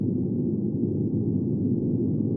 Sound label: HVAC